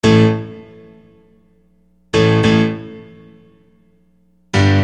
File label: Acoustic Piano; Construction Kit; HIP-HOP; Sonic Foundry (Sony Creative Software)